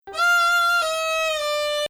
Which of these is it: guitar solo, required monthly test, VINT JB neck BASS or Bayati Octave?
Bayati Octave